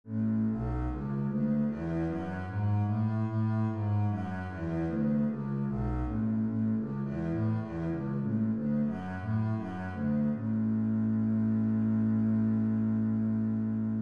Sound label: bass a major, scales